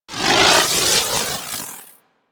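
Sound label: Crewship Missile Swarm Projectile By; Vehicles; Crew Ships; Weapons; Warframe; Rail Jack; Corpus Crew Ship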